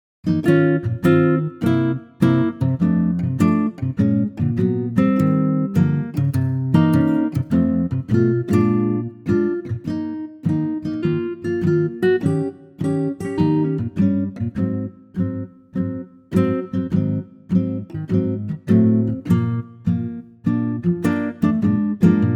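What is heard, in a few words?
Eb E acoustic guitar; key - Eb to E - vocal range - Bb to C#; Wonderful acoustic guitar track that's perfect for an intimate vocal, and it swings at a great temp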